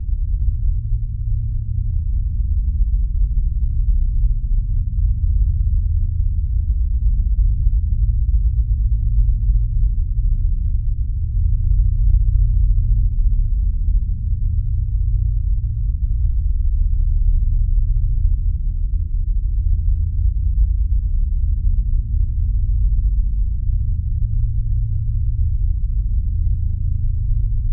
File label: Drone Power Source Deep Low Pulsing; Production Element Imaging; AdobeCC